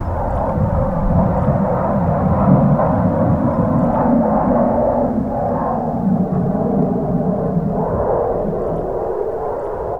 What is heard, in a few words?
wide aerial shot